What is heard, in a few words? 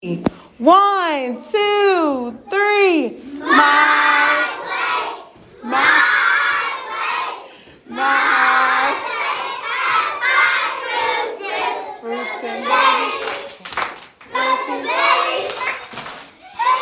MyPlate Song